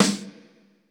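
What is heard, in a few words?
GATE SNARE; Crypton - DrumBase5000; GATE KITS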